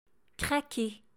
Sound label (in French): grille pain craquer